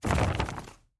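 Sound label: waving a thick sheet of paper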